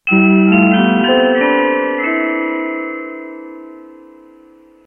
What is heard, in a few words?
4k 8nonPCM music